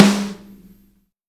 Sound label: Natural Snare